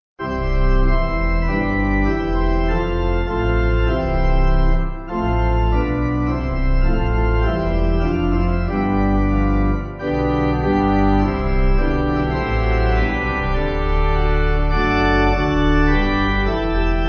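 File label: Pipe, Pipe Organ backing; Christ Whose Glory Fills; Heathlands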